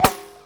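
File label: ricochet, weapons; Dystopia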